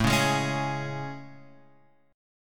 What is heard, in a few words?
A Major 7th A, Guitar, Open F; AM7 strummed; x,4,1,3,4,3; JGuitar